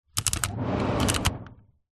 vehicles car fan speed dial turn fan on off short toyota rav4; zapsplat